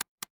office mouse click

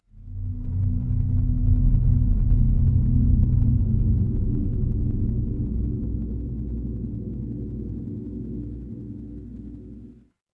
horror drone

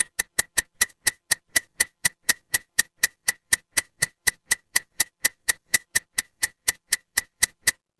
mechanical clock tick

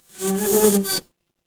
animal insect

